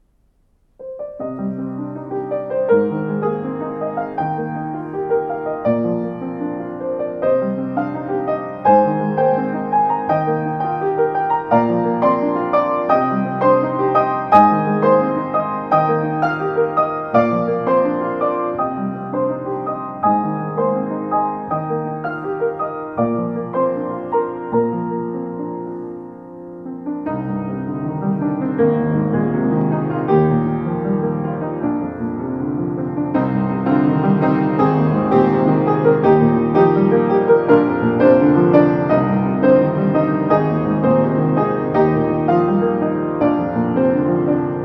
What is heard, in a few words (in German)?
Bechstein Klavier